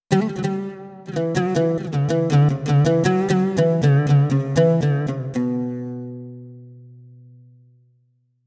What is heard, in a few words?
Yamaha PSR-A5000 Oud